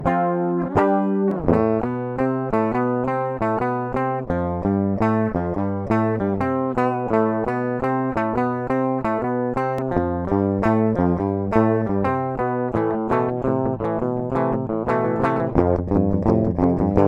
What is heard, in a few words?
Unmastered Guitars